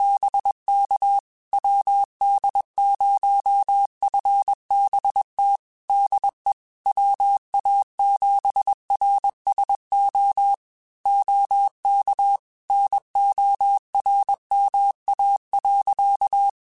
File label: Morse Code, QSOs